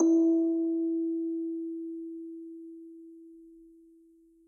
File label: orgel